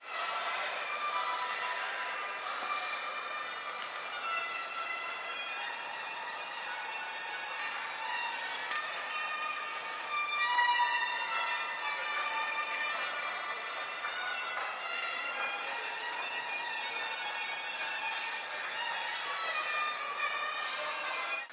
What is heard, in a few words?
violin; Violons